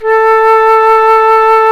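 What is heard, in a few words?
flute vibrato, wind sect; Roland L-CDX-03 Disk 1; Wind Sects 1; Wind Sect 5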